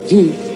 giggle

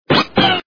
yoshi mount

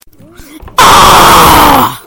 screaming earrape

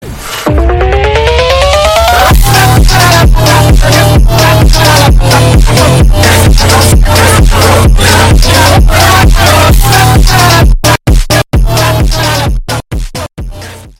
phonk

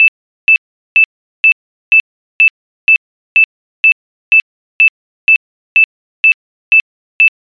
ars ringer; metrostroi

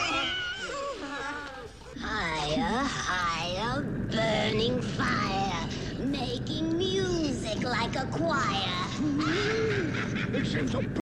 fire fire burning higher

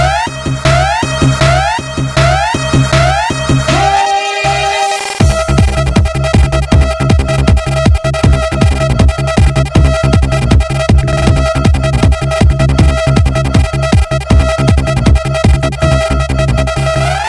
hardcore trance

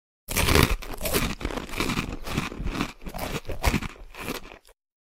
crunch

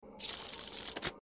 shuffle

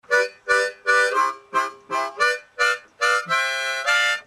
biribi gaita

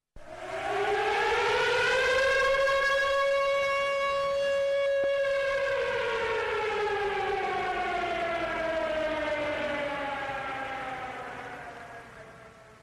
air raid siren

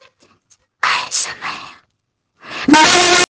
asmr

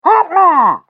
highfive; pyro

